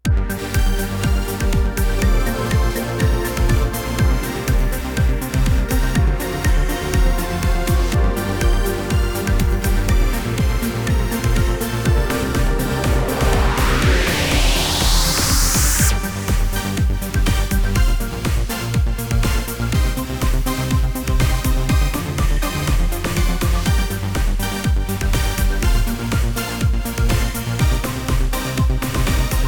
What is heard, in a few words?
Shock Wave long; Stealth Core Trainer Music Picks